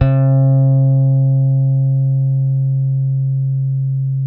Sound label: D 4, compresence, bass; Spectrasonics; Bass Legends